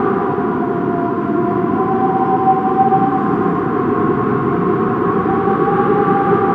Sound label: Air Loop; Props; Warframe; Tau; Ambience